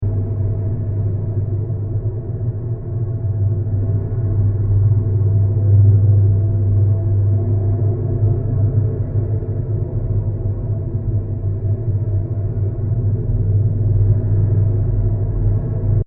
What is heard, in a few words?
Deep Space Ambience, 120bpm; Sound Design